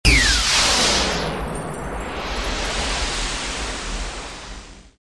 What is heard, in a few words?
Piyouw